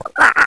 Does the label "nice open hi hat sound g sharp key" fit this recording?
No